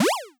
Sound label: jump middle